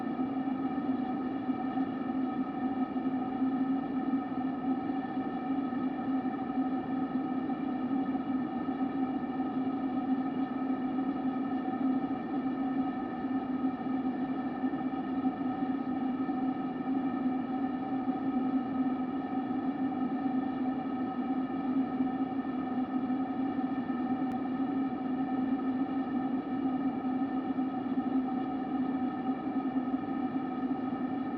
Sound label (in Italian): Rum Fondo Mic tubetto senza soffiaggio; CESI; LaSpezia